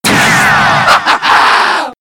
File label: teleport; freak fortress 2